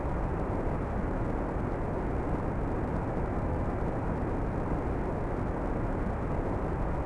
large hall, ambience; theed; Star Wars Obi-Wan; XBOX

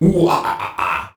tiki laf; hawaii

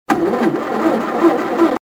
truck start; Soldier Of Fortune II Double Helix; XBOX; ambience

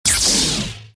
forcefield on; movers

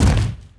boulder land dirt; impacts explos

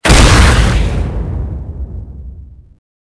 Launch; Quantum Redshift; kumiko

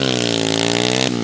engine

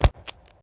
briefcase close; Max Payne

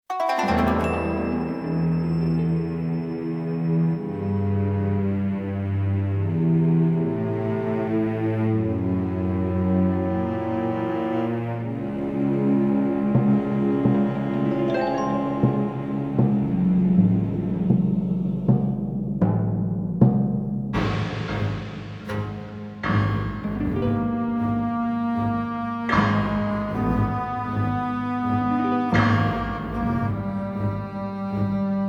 Chinese Ambient; music

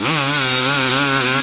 high, Engine

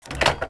Throw Heavy Switch; mechanic; Max Payne

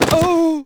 FALL, Rider

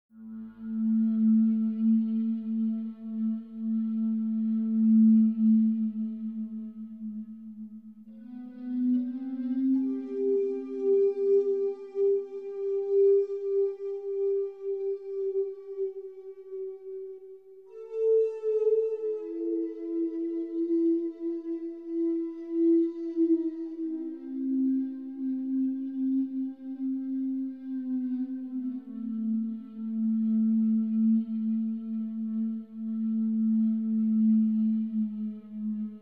pad; Myst III Exile

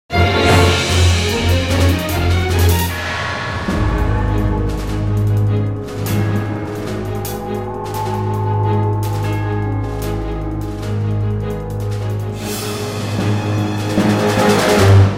Fight; Silas; The Da Vinci Code; XBOX

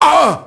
merc get hit; Max Payne

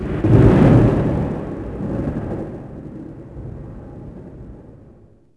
Thunder

